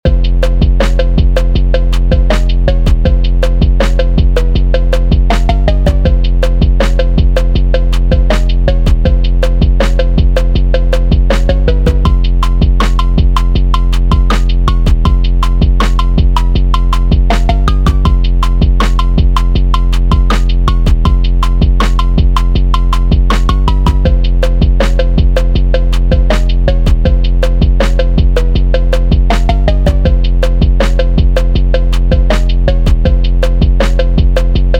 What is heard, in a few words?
E PHOONK